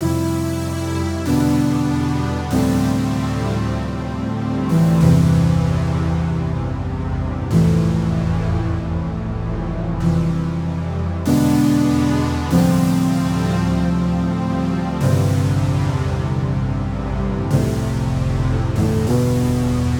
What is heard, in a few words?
96 synth pad Amin